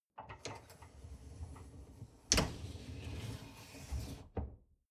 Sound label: dishwasher upper tray draw in long